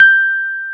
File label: G 1, Electric Pianos; Wizoo Electric Pianos